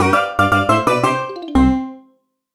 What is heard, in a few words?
BGM Jingle Player Down; mario wonder